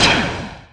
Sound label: arcfighter shoot; lego star wars